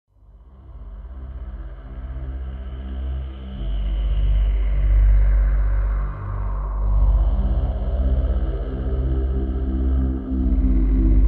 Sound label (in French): exemple sonore avec de bonnes vibrations